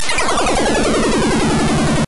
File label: Bubbles; Midway